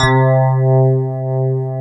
FM ELP 6 C2 L, Keyboards Old School; USB Soundscan vol.09; AKAI

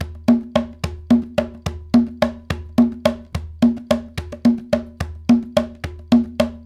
Bembe 6/8, World Dance

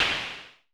SN Low Punch, Vermona Mono15 Drums Snare

